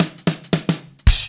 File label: FILL, Funky Ass Loops